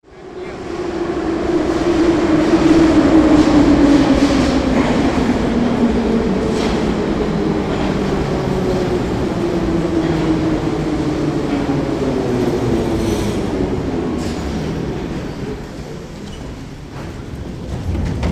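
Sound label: sound, metro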